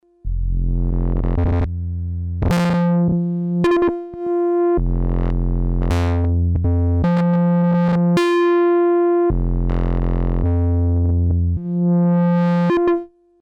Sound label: EXPR MIDI CC Moog Filter